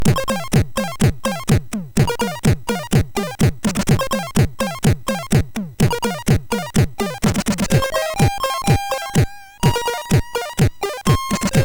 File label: Cool in Drums, MOS6581R3; sid